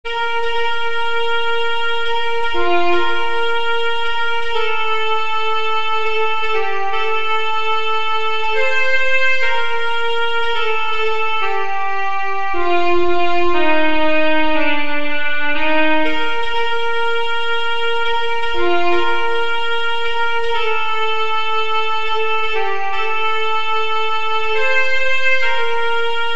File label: alto sax